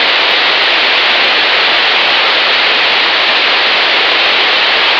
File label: Aircrafts Cockpits Static; DCS